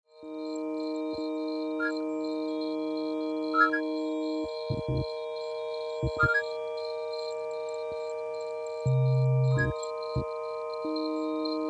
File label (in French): HK MUSIQUE OISEAUX RESERVOIR